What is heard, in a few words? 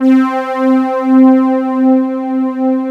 LUSH BED